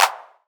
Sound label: Clap; Drum Kit 07